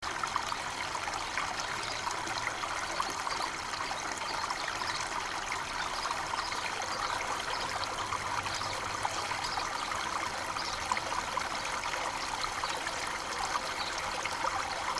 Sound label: Waterfall Sounds